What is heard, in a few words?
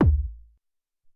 Ele Kik, Alesis SR-18